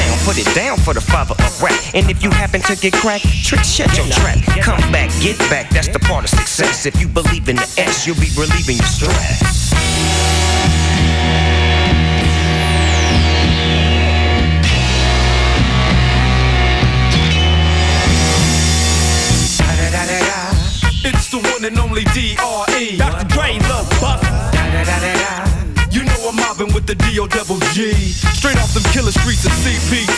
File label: hiphop